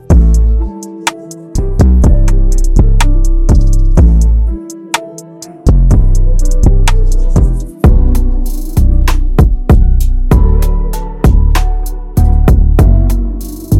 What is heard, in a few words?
Pressed Multi Kit; Drumify; Vikas